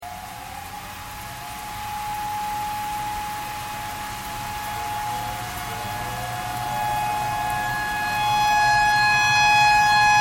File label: tornado siren tornado siren thread pentatonic